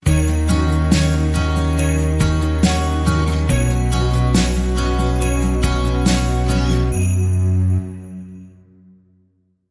Joyful Acoustic Christmas Soft Sting

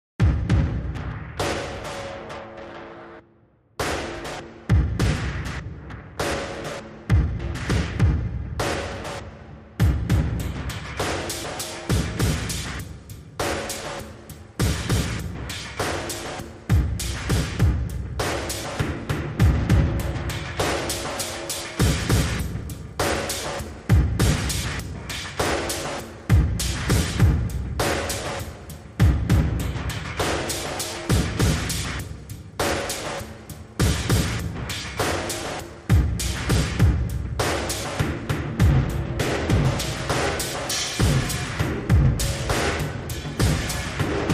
Drums Only

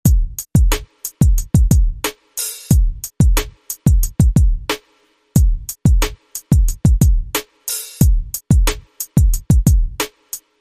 Drum Loop

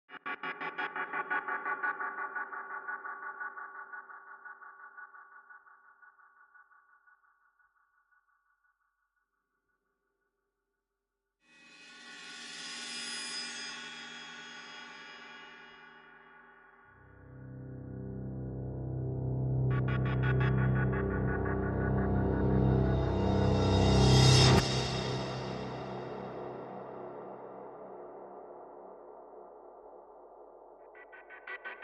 Outback No Piano

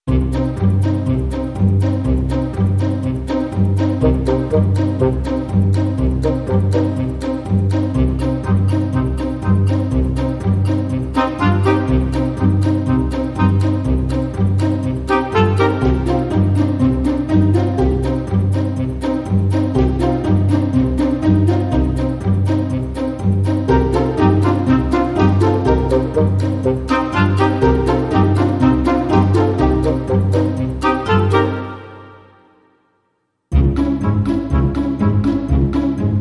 Traditional Percussion No Percussion

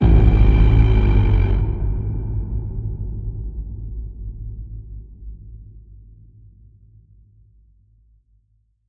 Beastly Godly Boom

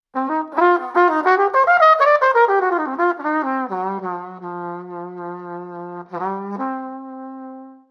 Blues Jingle

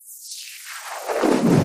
Thin Noise Sweep

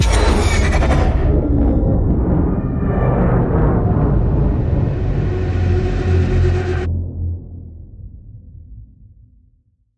glitch; Photon

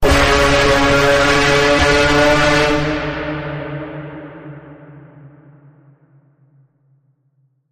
Bend, C sharp

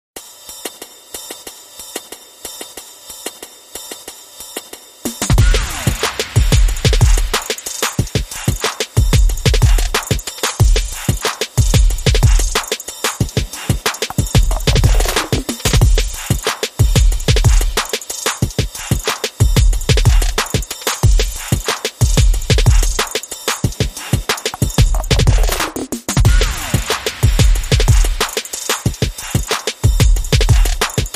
Beat

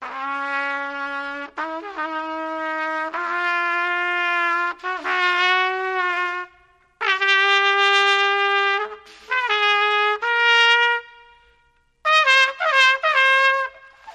Trumpet Bad Practice Scale With Reverb

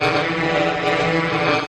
Hollow Wavering Buzz Transition

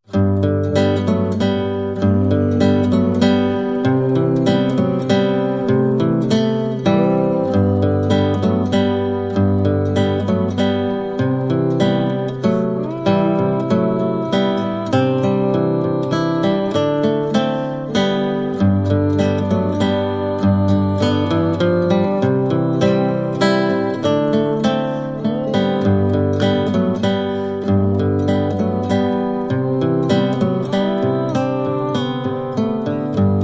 Tender Words

